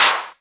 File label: Claps; Vengeance Samples